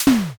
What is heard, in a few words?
wood snap